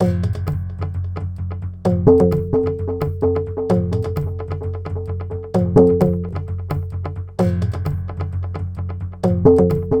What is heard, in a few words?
percus